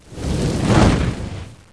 Fireball

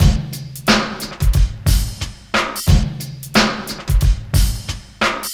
Compressed Drum Beat

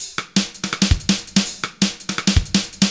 Organic DnB Loop

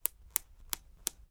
Button Snap

